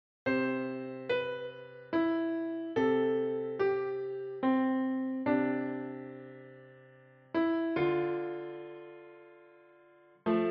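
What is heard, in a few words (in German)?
Der Schwan, Klavier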